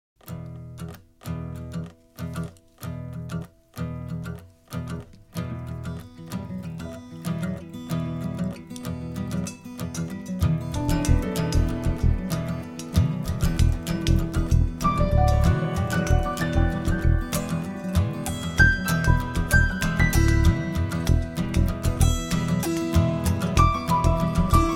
Acoustic Version

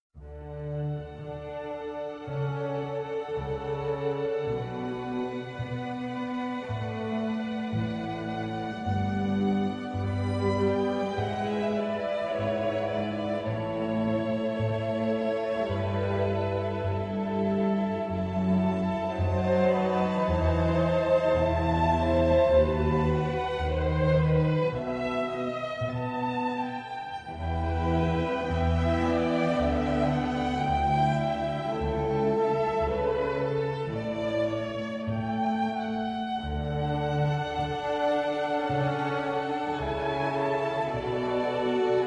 Ouverture in D Major, Air; No. 3; BWV 1068

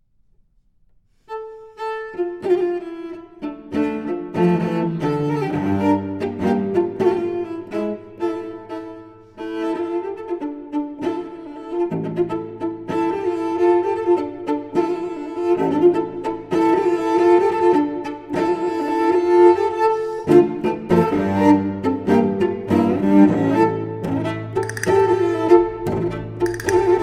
Fandango Per Violoncello Solo; Sollima

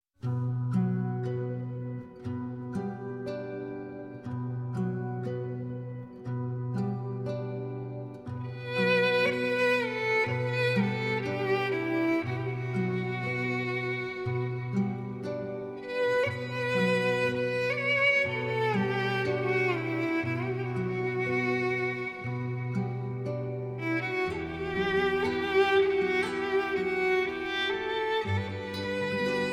Violin

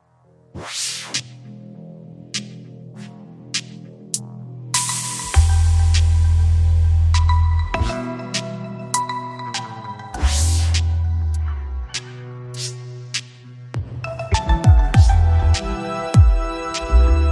Ambient Version

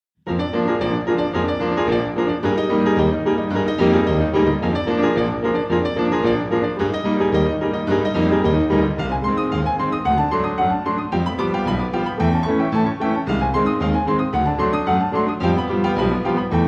Piano duet; Orphée; Act 1 - I. Le Café

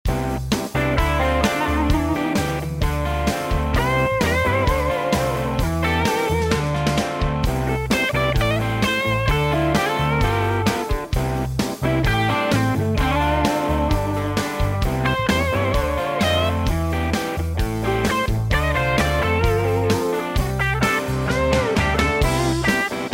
Instrumental Blues

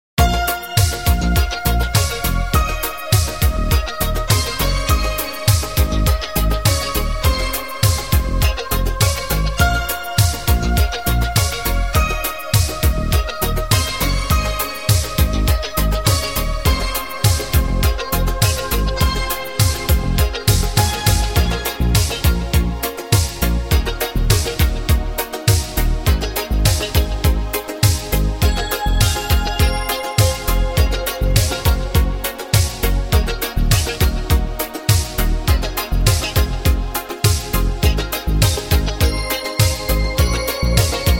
Instrumental Mix

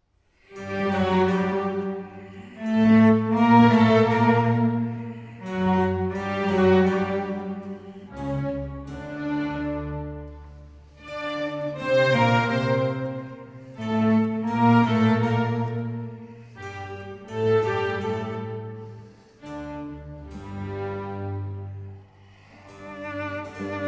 Cello Concerto in D Major

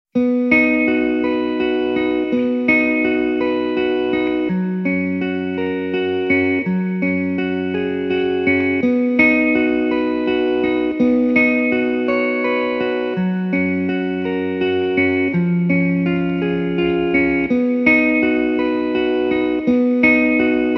Gentle Optimism (Guitar)

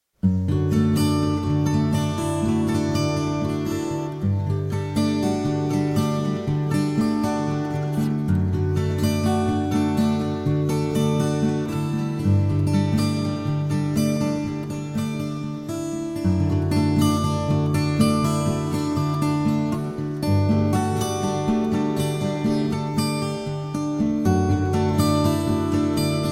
Guitar

